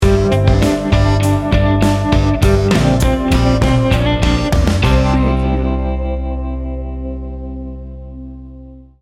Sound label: remembering sting bumper